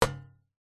metal small road sign knock hit with small metal object; impacts; zapsplat